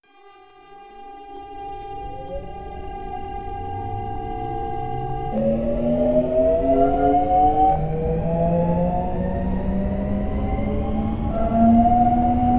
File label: motor noise